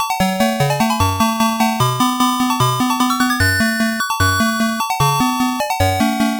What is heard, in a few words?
Miss; Bank Panic; Arcade